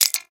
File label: switch click; soundscrate